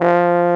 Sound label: BARITON; Best Service; Alpine Volksmusik